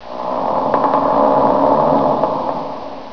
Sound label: squeek